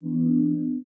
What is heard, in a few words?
dialog close